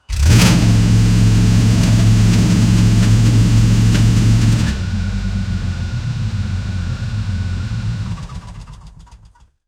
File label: 6 sets of twin turbos